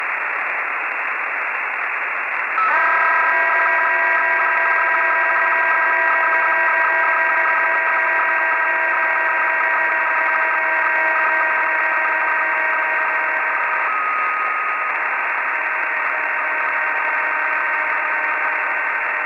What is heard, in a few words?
PSK31; PSAT